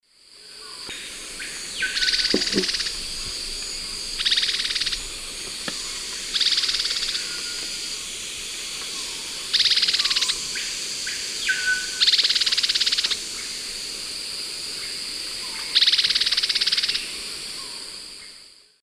Myrmotherula axillaris, alarm call female, S. America Eastern Lowlands